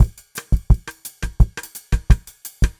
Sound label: 086 Latin Brazil Drums Cross Gate; Pop Rock; Moderate Tempo; Pro Tools Essential Loops; Digidesign